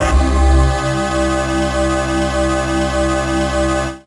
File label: syn, c2, Synclavi, FAIRLIGHT; Best Service ProSamples vol.55 - Retro Sampler